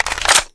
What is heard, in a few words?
psg bullet in